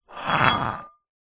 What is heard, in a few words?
glass bottle wine drag concrete ground; foley